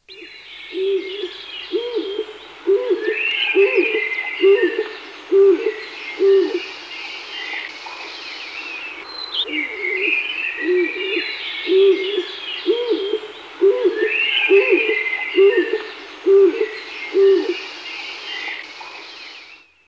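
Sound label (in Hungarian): Kék galamb; Madarak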